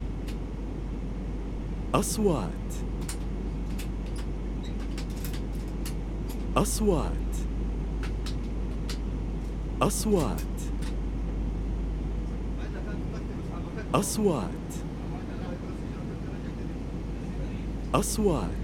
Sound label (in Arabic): رحلة الطائرة حزام الأمان; airplane seat belt